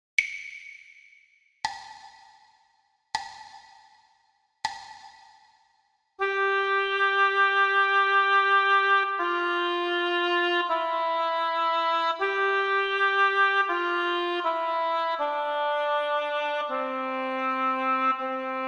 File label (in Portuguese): percepcao ditado